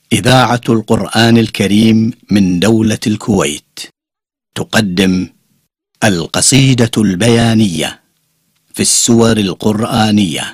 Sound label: quran; KWT